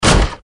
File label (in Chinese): 开门